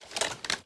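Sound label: Inspect Movement; Valorant; cstrike; operator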